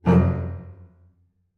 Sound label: Low Note Scary Stab; soundscrate